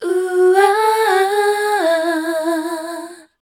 MEL F#, Voice Spectral II, MELODIC; Best Service

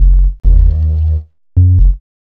B-LOOP

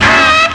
stab; ZERO-G; Datafile

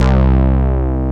BASS

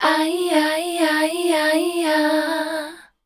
MELODIC, Voice Spectral; Best Service; Voice Spectral II

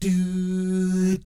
DOO SFZ, Vocal Jazz Fx

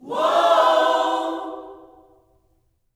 WHOA OHS, Gospel

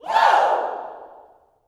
WOO, Vocal Planet Gospel YELL SHOUT; Spectrasonics; Vocal Planet Vol.1 Gospel; akai

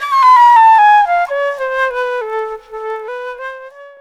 D.FLUTE; E-Lab; X Static Goldmine 2; akai

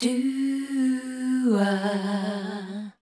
MEL C, Voice Spectral

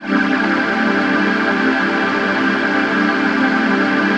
PHASE PAD, ATMO PADS; Best Service; XX Large

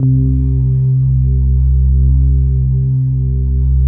pad; Best Service; XX Large; Pads

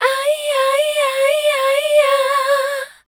MEL C2-R, Voice Spectral; Best Service; Voice Spectral II

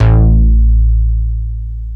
BASS; ZERO-G; Datafile

